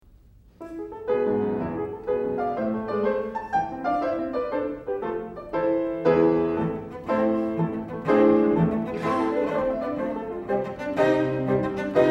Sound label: Scherzo Trio